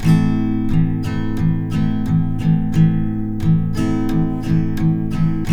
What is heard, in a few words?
TK MIN A#2, Guitars; Spectrasonics; Hans Zimmer Guitars VOL-1